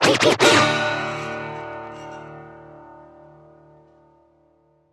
Action Exit (Scratch), UI SOUNDS - MUSICAL; Sound Ex Machina

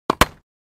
Ball Hit Net, Tennis Balls; JC Audio; Tennis Balls And Ambience

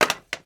Impacts Soft - Short, Crack, Wood Impacts; Double Trouble Audio

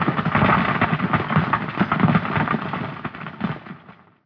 rubble; hl2; roof top

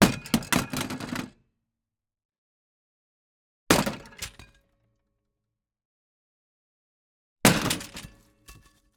EXT Metal Impact Drop, Car Destruction; Coll Anderson

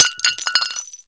small pottery, bullets impact; hl2

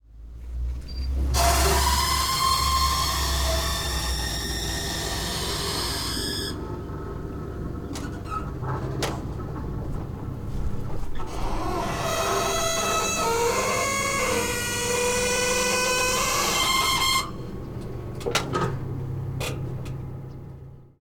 Dock Old, Industrial, Barrier, Creak; Dock Ambiences and SFX

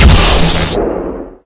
electric shoot; hl2

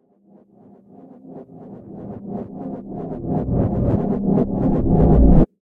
Reverse Flutter Riser, Transitions; L.A. Sounds

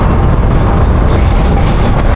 big move, plats; hl2

